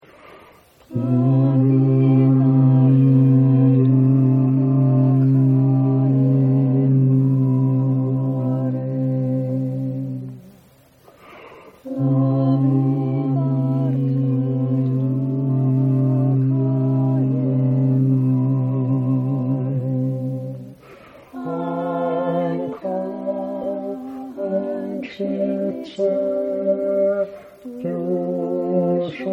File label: Bass